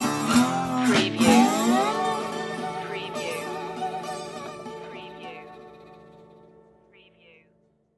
stinger

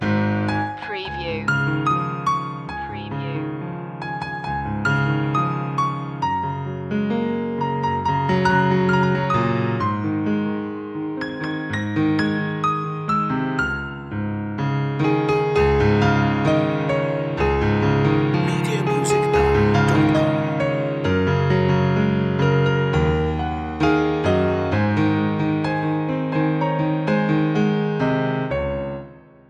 piano loop; jingle bells; media music now

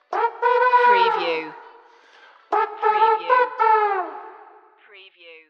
cleos jig stinger

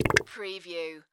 Fart Wet

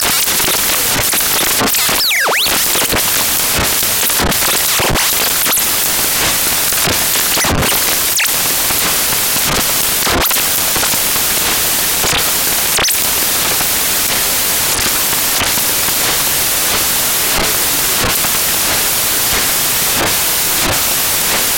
Synthetic Tuning

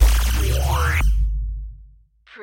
Hit Digital; Production Element; Sound Effects Bible

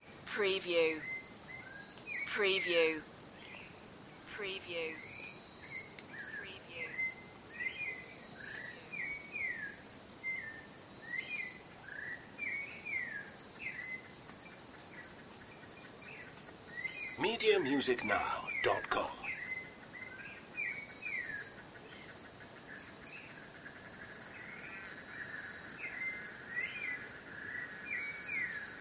crickets and birds

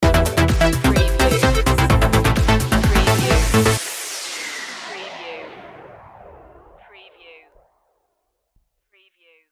malfunction sting

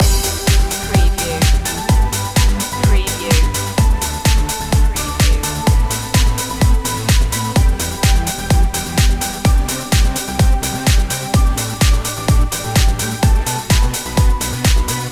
spin me around loop

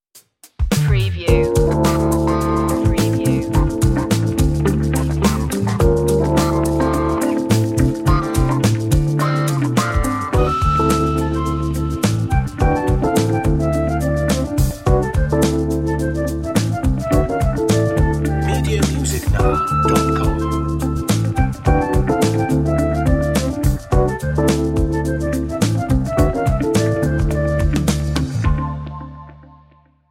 acid jazz

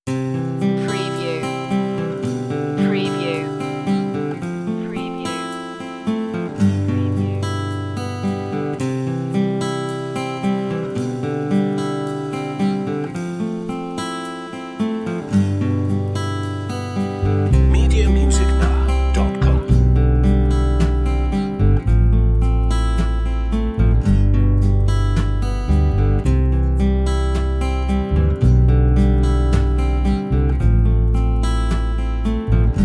acoustic ocean underscore

